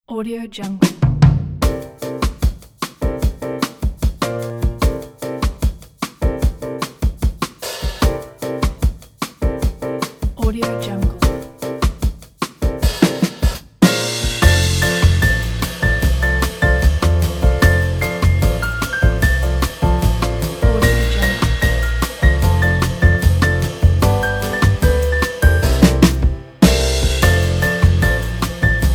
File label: jingle bells brazilian jazz